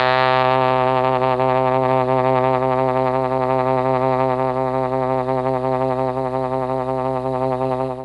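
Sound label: C2 trombone trumpet, mellotron; TROMB+TRMPT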